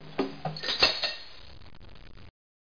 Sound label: gate close; Broderbund Print Shop Multimedia Organizer Deluxe Edition